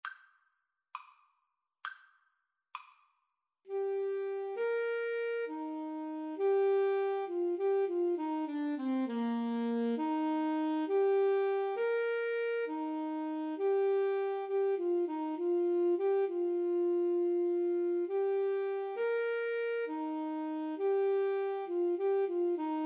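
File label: Alto Saxophone Duet, karaoke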